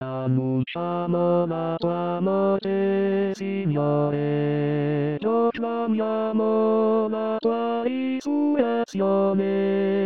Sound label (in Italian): Tenori